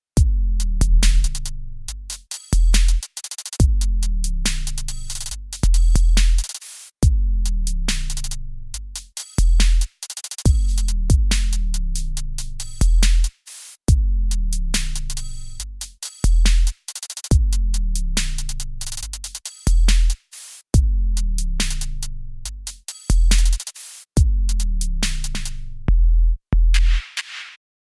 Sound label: magnetismus 2 Drumloop neutral